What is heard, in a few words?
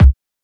Kick